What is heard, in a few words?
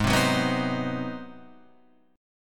Ab 7th Sharp 9th down Guitar Open F; Ab7#9 strummed; Ab7#9 Chord